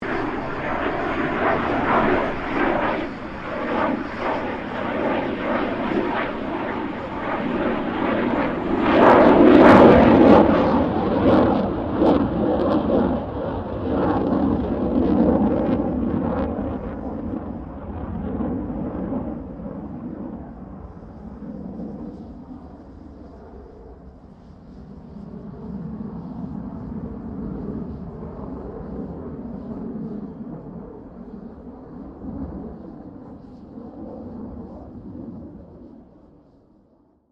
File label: FA-18 Hornet pass distorted, stereo; RAAF FA-18 Hornet; Airplanes; Vehicles